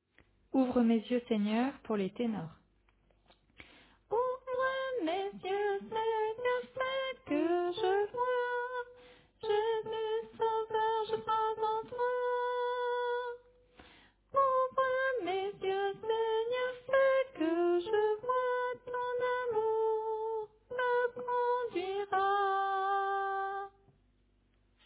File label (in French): Tenor